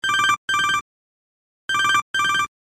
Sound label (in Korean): 전자 벨소리